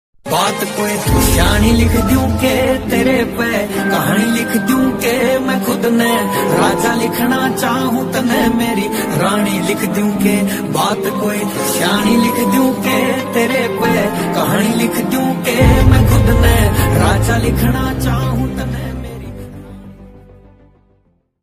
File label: ringtone haryanvi song ringtone